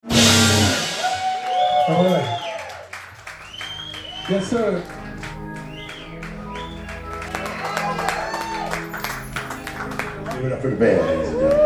blues interlude

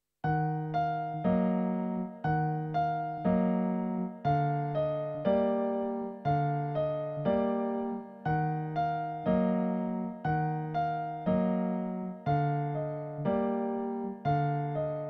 piano; Little Nightmares; piano tutorial

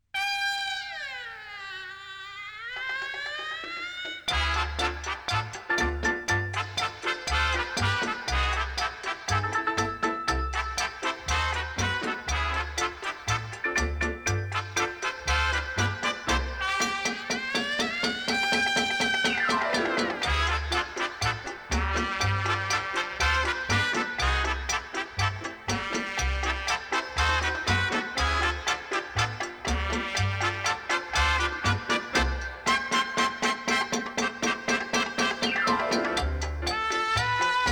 trumpet cha cha cha

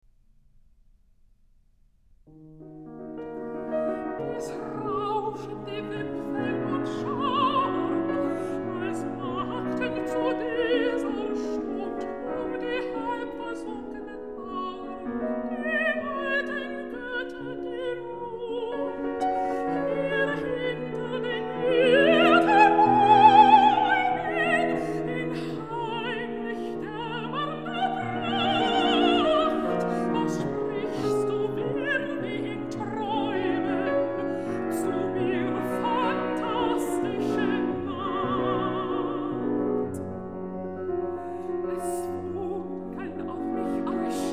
songs for voice & piano; Schöne Fremde; Es rauschen die Wipfel; Schumann; Liederkreis, Op.39

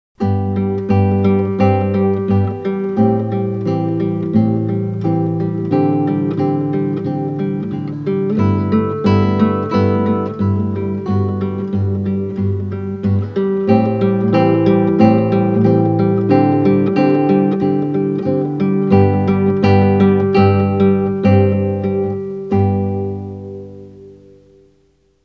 instrumental

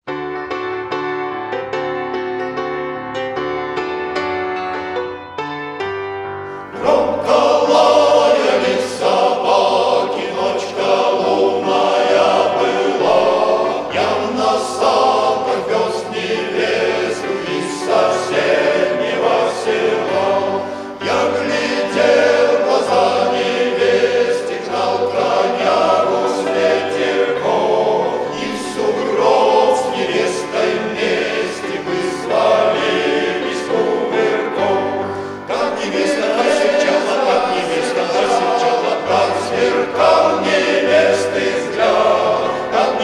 akademicheskiy mujskoy hor

